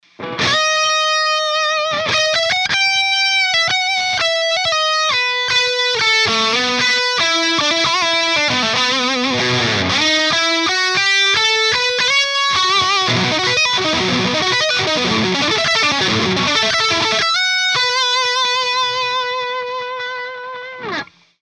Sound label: Solo Clipping Off